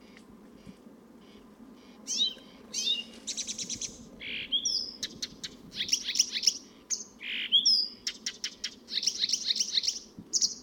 busksångare Acrocephalus dumetorum

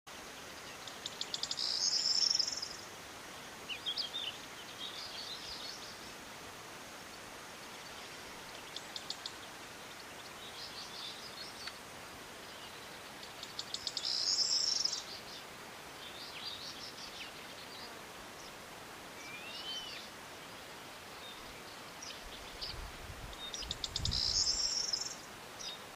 Kornsparv

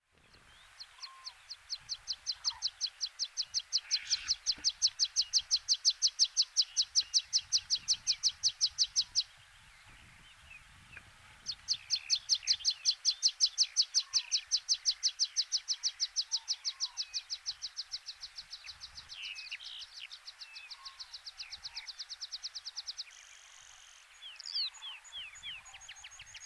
Ängspip

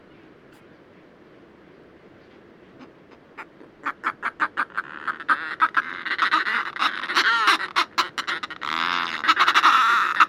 Stormfagel

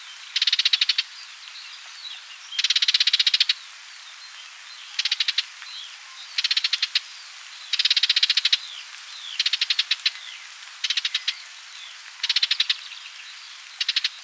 Höksångare

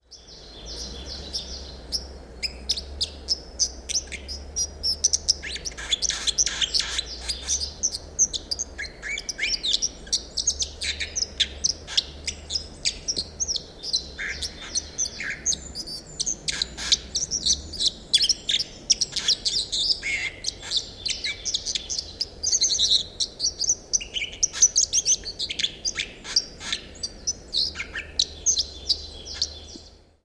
strömstare

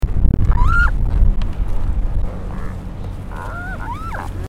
Kejsarskarv

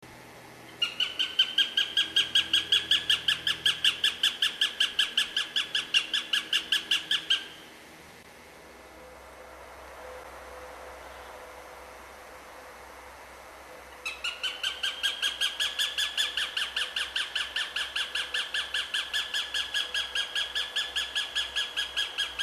Duvhök

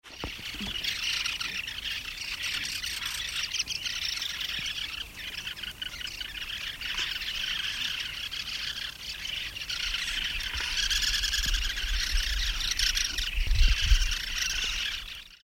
Kaspisk pipare

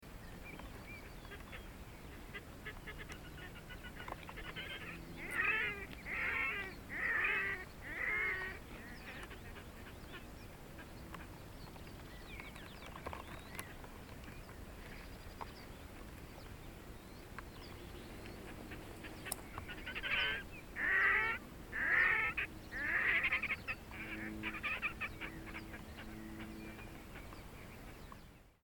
Gråhake

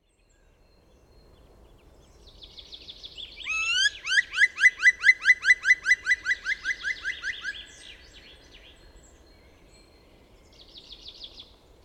spillkråka

